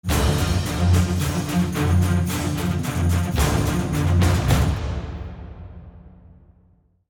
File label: Stinger; Rustwix